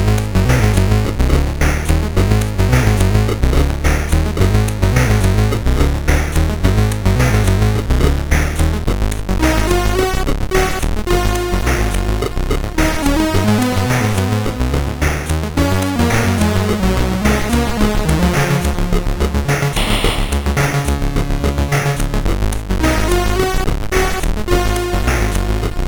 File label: Mini Melodies, SID CSG8580R5